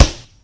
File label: Flesh Wood, collision sounds; opensim; CollisionSoundsAssetSet